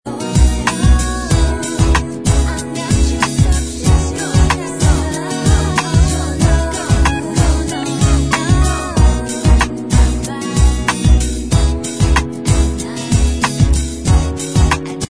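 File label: backing vocals, backing tracks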